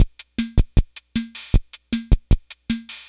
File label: Yamaha PS-3 Rock